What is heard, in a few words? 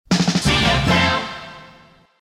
snare flourish in; CFL; Imagers; Shotgun